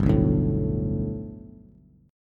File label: G Major Add 9th Bass, chord; Gadd9 strummed; JGuitar